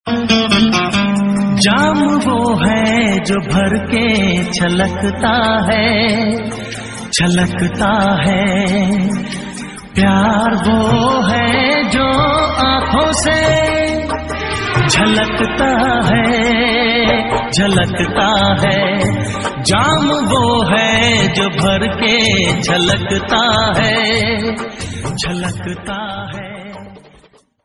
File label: romantic hindi